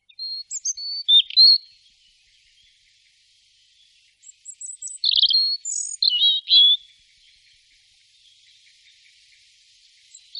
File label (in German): Rotkehlchen, Voegel; Erithacus rubecula